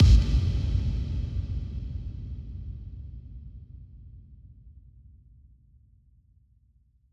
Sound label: bassdrum reverb FX kick; Vengeance Samples